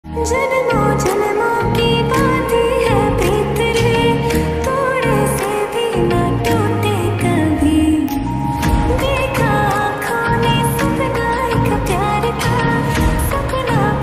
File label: lofi remix hindi ringtone